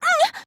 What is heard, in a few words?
"ouh"